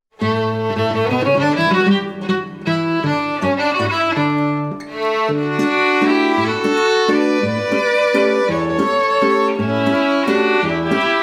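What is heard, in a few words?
Verena Walzer, Violine & Gitarre; volksmusik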